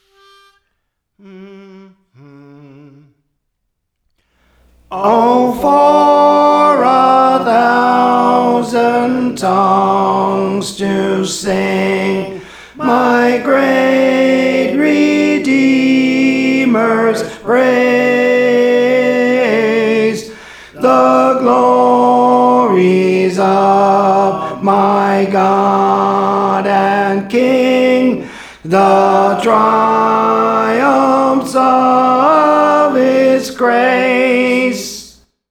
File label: Tenor, Songs; Praise For The Lord Training; Belmont